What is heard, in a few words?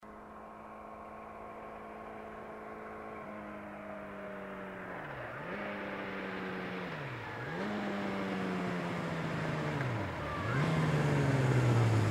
Motorcycle approaching, turning off